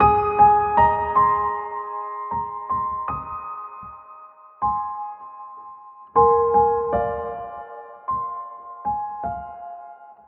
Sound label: piano